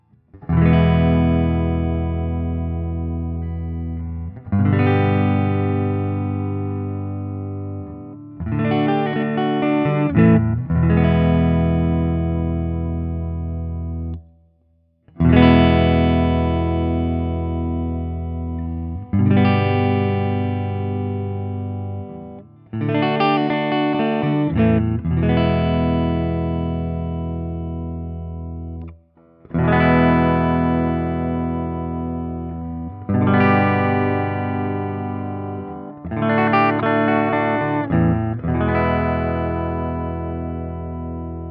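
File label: Guild Starfire I SC ODS100 HB Open, guitar; Guild Newark St Starfire SC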